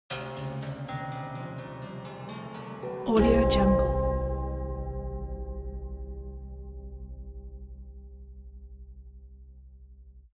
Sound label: musical horror haunted strings chromatic rise